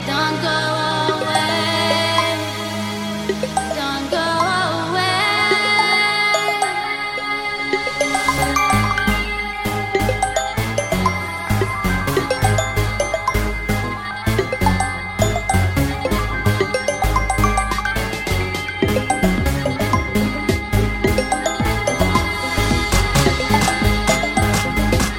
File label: Vivo S10 Pro Ringtone